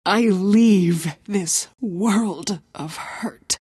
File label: pain death; queenofpain